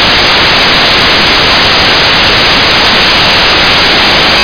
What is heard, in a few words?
GAME END OR FAILED